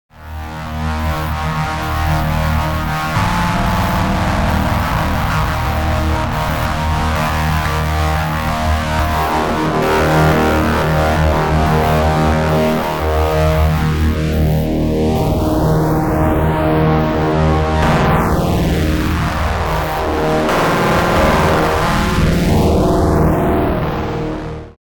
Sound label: Elektron Analog Heat Rund Fuzz Maximum